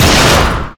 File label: Enemy Temple Active Cancel; Disney Star Smash; Android, iOS, Mobile